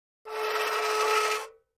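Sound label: Metal Scrape On Cement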